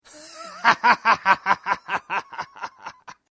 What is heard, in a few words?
Laugh Male Laughter Laughing Male; human sound effects